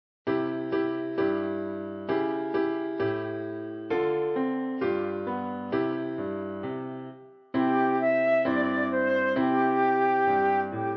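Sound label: flute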